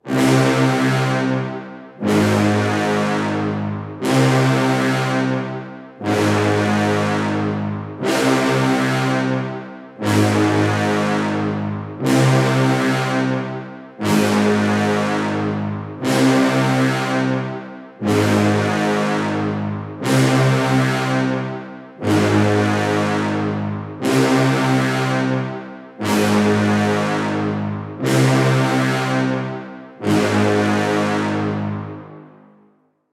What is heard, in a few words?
Low Brass - Cm 120BPM; Construction Kits; STEMS; GhostHack; Ghosthack - SkyRealm; Kit 12 - Ravensthorn Manor